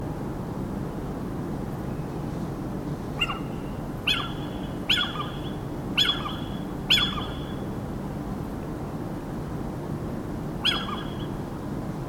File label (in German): steinkauz; Athene noctua